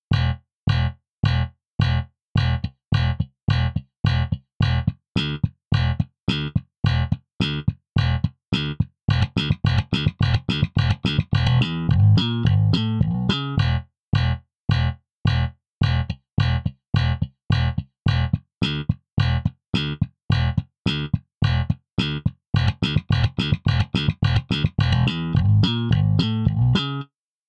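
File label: Modern J Bass, default setting, Slap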